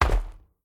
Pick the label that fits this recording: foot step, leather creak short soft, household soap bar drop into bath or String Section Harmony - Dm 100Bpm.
foot step